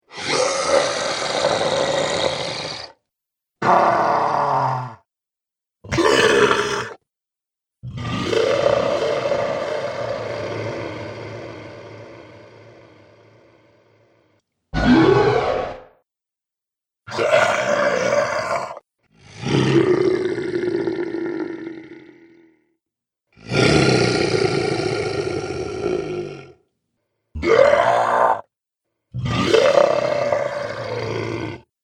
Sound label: Scream Demon Soundpack, monster; horror; Monster Sound Effects